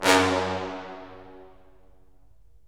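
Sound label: brass cimb, Roland; Roland LA Composer vol. 4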